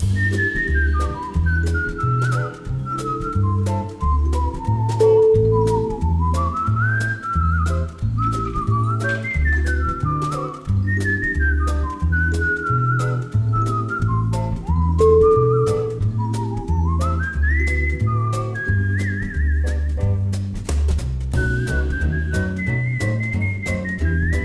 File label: whistling in the park